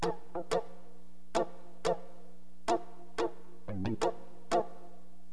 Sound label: SKANK, HipHop; LiveAct Pool